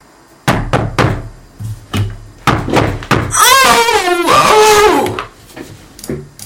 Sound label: realistic knocking on door